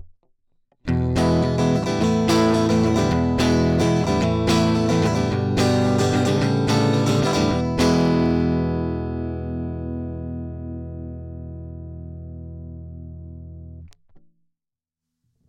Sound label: E Gtr Direct crunch; Warm Audio TB12